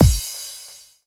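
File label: bass drum FX, dance drums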